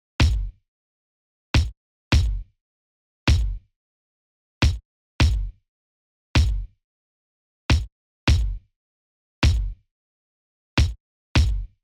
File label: kick dis